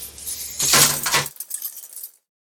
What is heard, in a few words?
Jail Door Human Un Lock